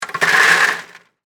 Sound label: Ice Chest Dump, Ice, In, Bucket, Restaurant; Miscellaneous Bar